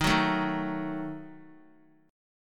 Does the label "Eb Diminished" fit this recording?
Yes